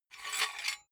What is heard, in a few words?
silicone gun empty pusher push fast; soundpool